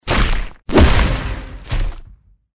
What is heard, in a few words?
attack; hero sound